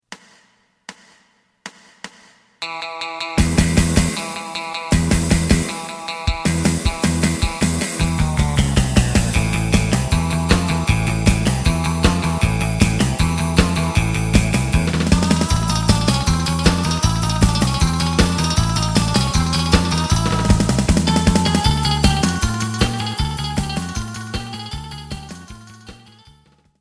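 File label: side guitar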